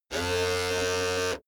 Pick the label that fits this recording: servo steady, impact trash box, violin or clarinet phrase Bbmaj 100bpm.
servo steady